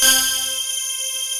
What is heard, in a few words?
HOLLOW SYNC, SYNTHS; Pro-Rec - Synthsonic